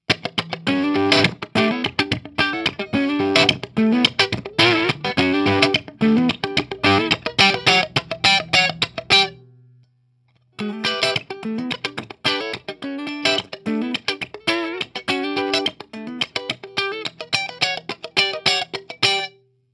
Neck PU Clean Both Voicings

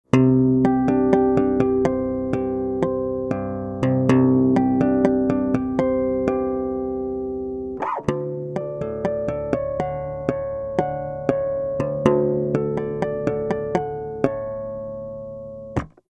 Flageolets

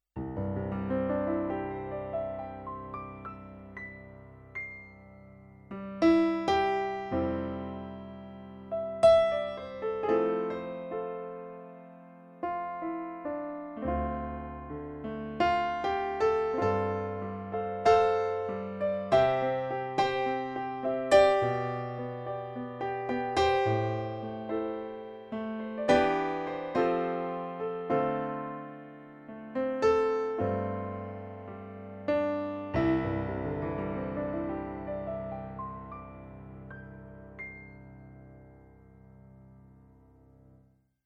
Piano

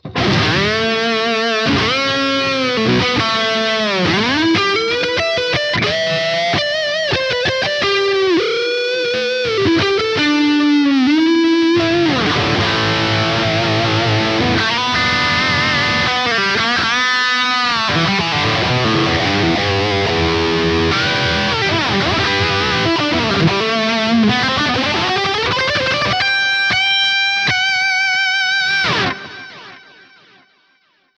Kemper vs Quad Cortex Lead Kemper

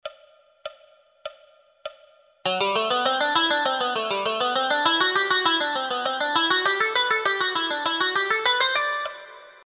SCALES Two String

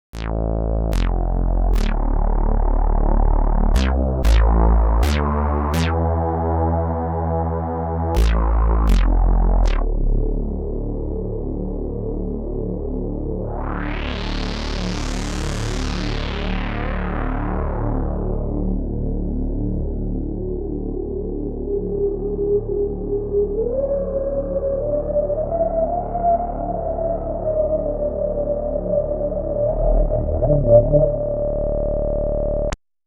Chorus

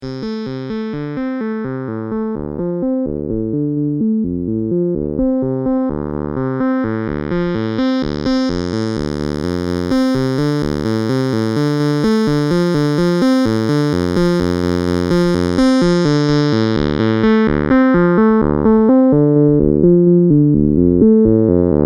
Arpeggiator

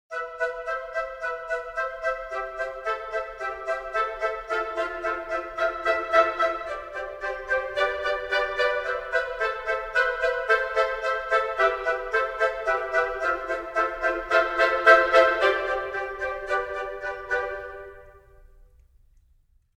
Woodwinds Short; Albion One

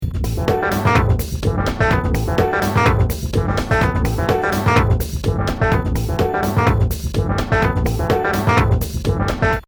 French Houz Space

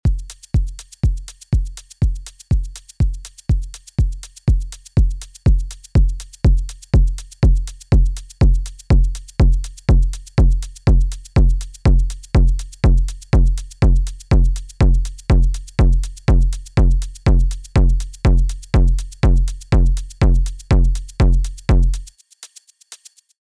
Kick Elektron Overdrive; How to Techno

